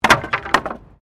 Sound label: Wood Fall